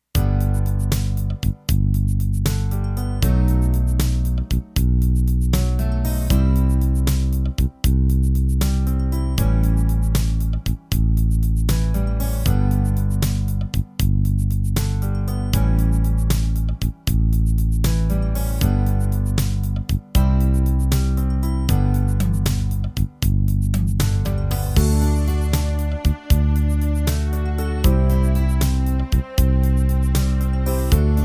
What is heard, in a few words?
12-8 Ballad